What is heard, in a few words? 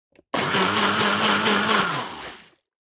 Blender